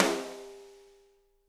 SD, Drums; Bob Clearmountain Drums I

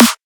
drum snare small boi; Virtual Riot; Drum Hits

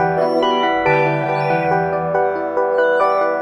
Pad

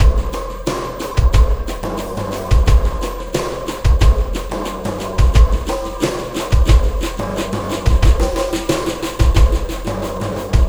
Sky Lab Beat, Processed Drumkits

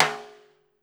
STR SNARE, Brazil Drum Perc

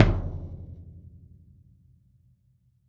The Big Island Drum; Sonic Foundry (Sony Creative Software); Joe Vitale Latin Percussion

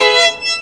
VIOLIN LINES; Best Service; World Colours

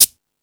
Percussion, Drum Machines

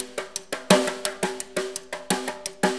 Brush Perc, Perc Loops; Drum Tools

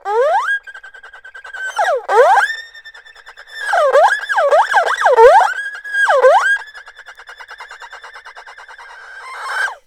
ERHU HORSE; INIS - Opium; ERHU MAGIC

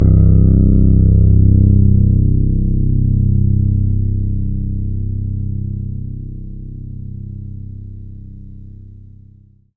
C1, synth; 90 s Sample CDs; NiceBeats; SynthLine VOL-23

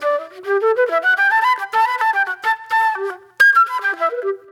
Fusion Flute

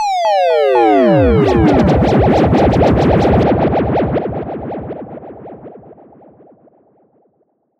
fx down zaps

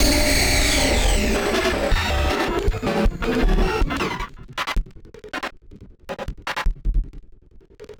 Battered Synth; malfunctioning synth samples

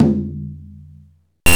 DRM HYBRID, Africa; Roland LCDP14 Africa VOL-2; Afro Menu 1

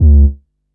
Raw Bass, Drum & Bass; Fluid Dynamics

